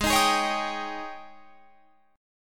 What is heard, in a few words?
G sharp Major 7th Suspended 4th G sharp, Guitar Open F; G#M7sus4 Chord